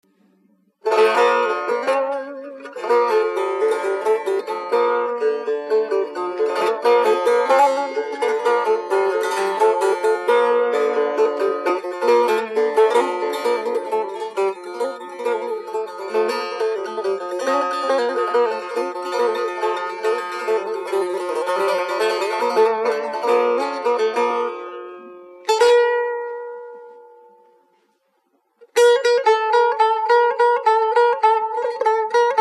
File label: setar